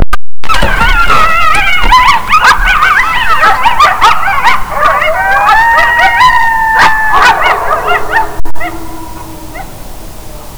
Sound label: cayote; Cayote (version 2); zoology